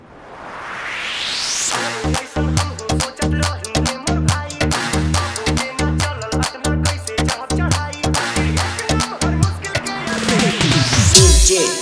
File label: Edm Dorp Mix; Sawan Special BolBom 2.0 Mix